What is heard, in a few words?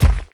Kiwi Step; Lethal Company